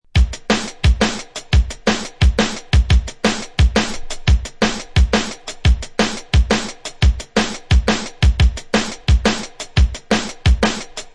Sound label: Kik An Snare Variation; jungle breaks; ray keith dread jungle breaks vol 1 60 beats for dubplate clashes battle junkies